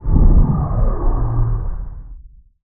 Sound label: comet; artifacts